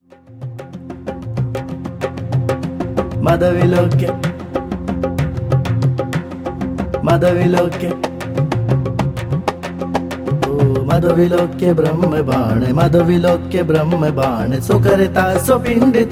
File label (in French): chant tambour